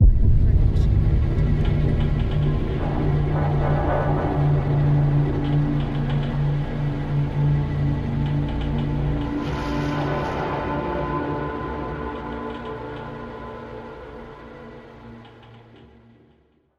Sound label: Suspenseful Ident; soundscrate